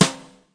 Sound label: BRUSH SLAP, Roland TD 7; DRUM MACHINES